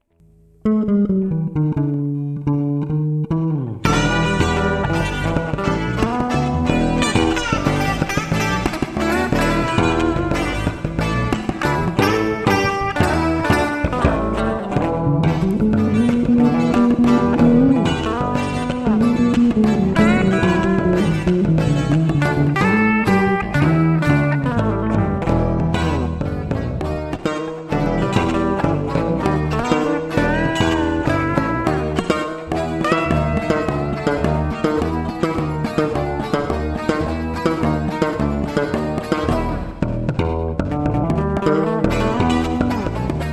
viola improvision

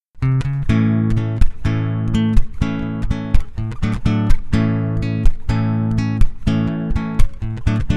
sax alto; adeste fidelis

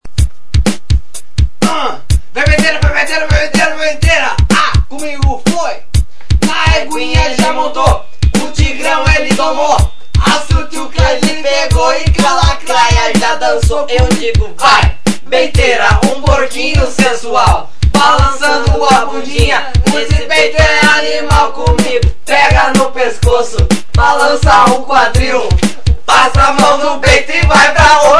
funk

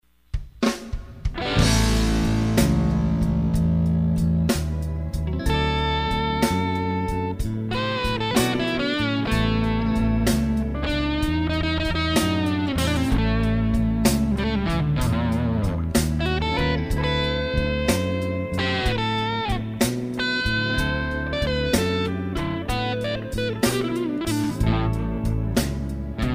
blues

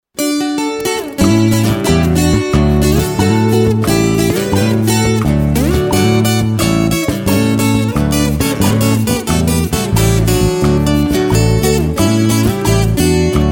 Viola

